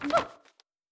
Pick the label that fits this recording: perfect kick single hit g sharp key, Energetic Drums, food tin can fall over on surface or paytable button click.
paytable button click